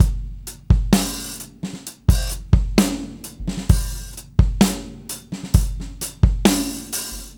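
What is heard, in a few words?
65 bpm, beat loops; Zero-G; Monster Beats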